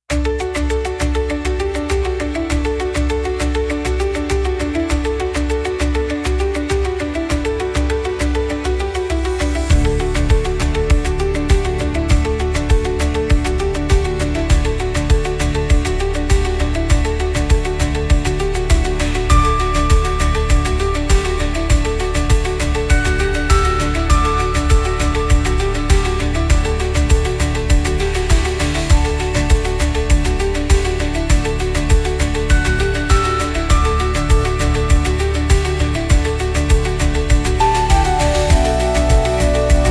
Lantern Breeze, light and japanese pop for festivals; bright everyday use